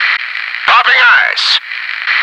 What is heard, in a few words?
noice